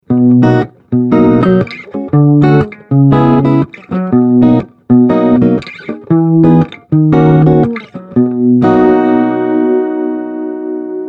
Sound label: Behringer ACX450 Chorus